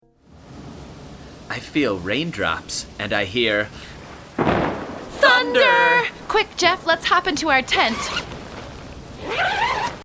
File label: thunderstorm